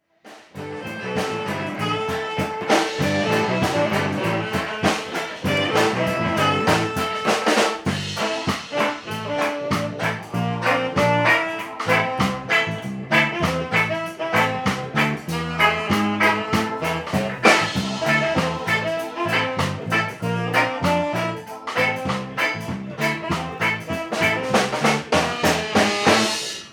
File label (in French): quelques secondes de musique dans la rue du village de creteil